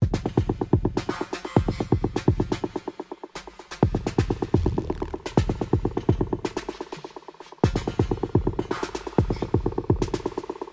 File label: Glitch-Noise-Distortion-Chaos